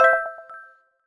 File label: chip minor